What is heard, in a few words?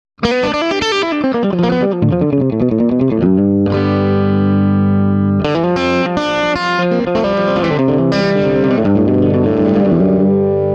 Swart Amp; Swart Atomic Space Tone